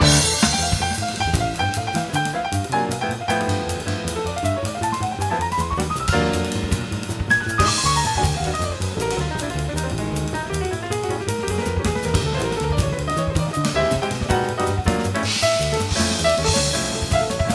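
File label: battle piano drum; stranglehold; mus mega battle2 pno drm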